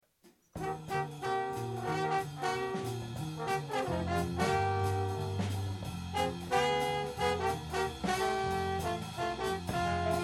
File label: Jingle Bells, BigBand; Probe